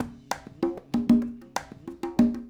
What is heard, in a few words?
Congas, 70s Analog Funk; Sonic Foundry (Sony Creative Software); What it Is!